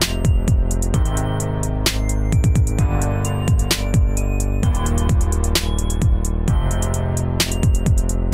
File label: Notification Ringtone; Galaxy A5